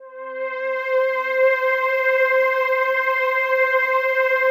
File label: PAD, DIGI-PADS; Best Service; XX Large Pads; Sample CDs